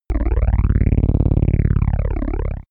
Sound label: synthetic heli; comedy-cartoon; soundpool